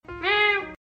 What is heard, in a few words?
meow